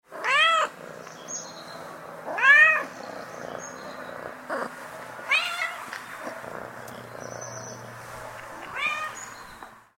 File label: Sound of cat purring and meowing